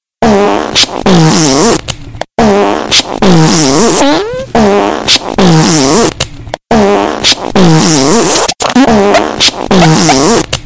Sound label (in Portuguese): peido song ringtone